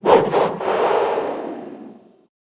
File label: yeti die, monsters